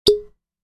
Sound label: Glass bottle finger pop